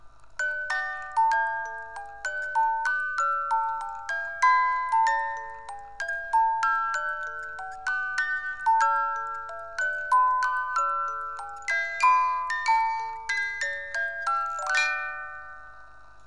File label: Kaiserwalzer, Music Box